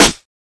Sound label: Old School Verby Snare Single Hit C Key, acoustic snare tuned to the C note